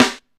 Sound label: warm steel snare drum b key